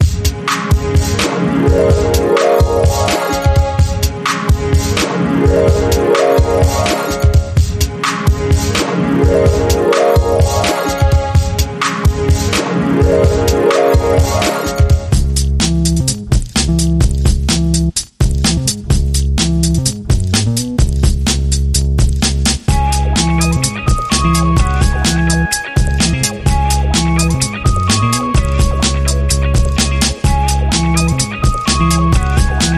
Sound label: Harp